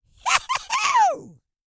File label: YELL, MALE HUMAN; Spectrasonics Vocal Planet